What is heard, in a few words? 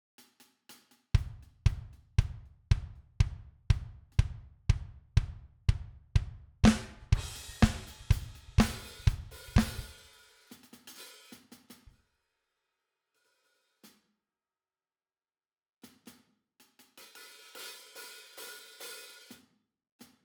Drum VST; JASS Session